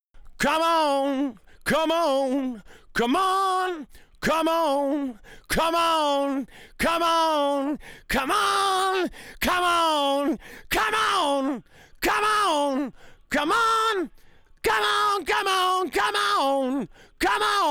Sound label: Lead Vocals